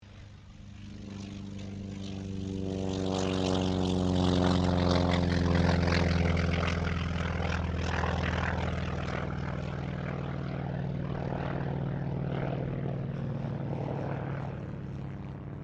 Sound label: AIRCRAFT T-28D TROJAN TAKEOFF